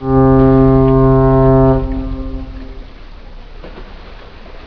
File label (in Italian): nave, Veicoli; Effetti sonori